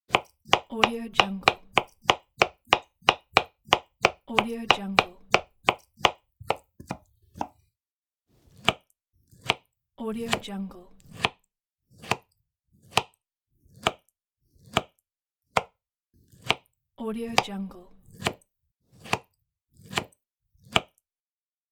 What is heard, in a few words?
knife cuts on the cutting board